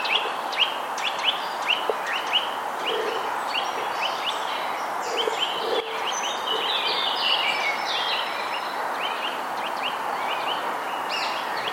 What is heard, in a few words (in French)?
sittelle torchepot etang